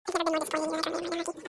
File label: disappointment